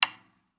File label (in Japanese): かっ 生音